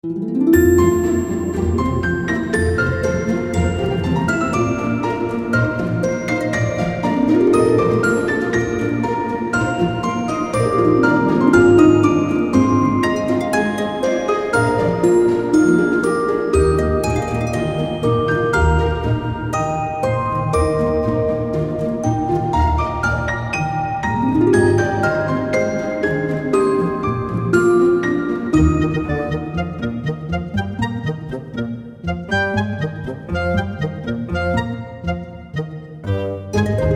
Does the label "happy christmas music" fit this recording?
Yes